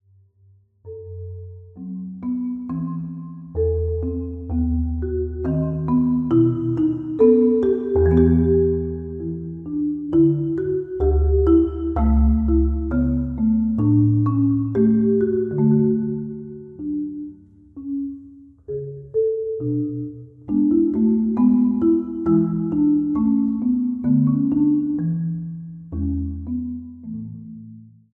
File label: air g string marimba solo; bach